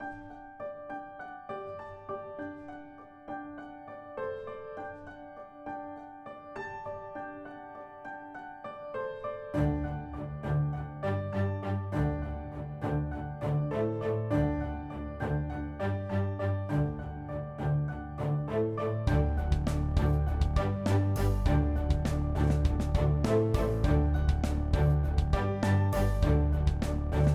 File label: Piano And String Song